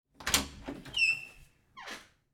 Door Opening

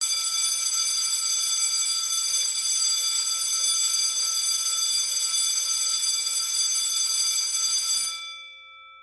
class bell

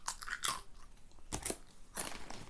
chips

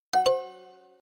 wrong; duolingo

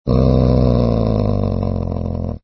ANIMAL DOG

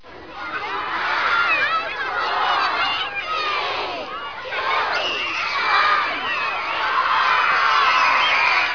people

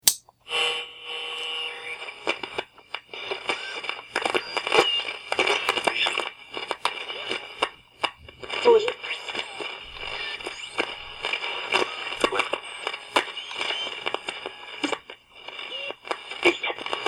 am radio tuning

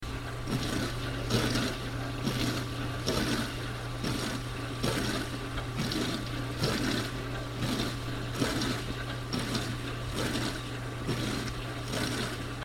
dishwasher

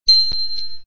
ping

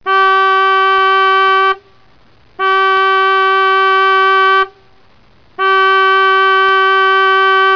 nautical

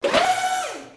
servomotor

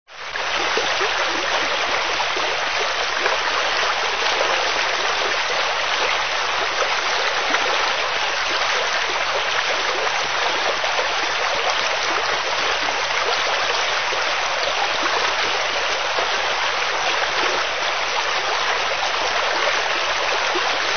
rain

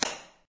pop

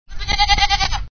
GOAT

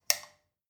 Light Switch

